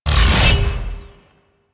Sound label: Mech Stab Ability; Nechro Mech; Vehicles